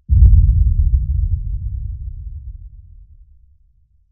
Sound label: Explosion, 5.1 Surround; Sony Pictures Sound Effects Series; SUB